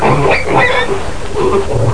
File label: pig; matchem